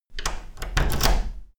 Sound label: door key in out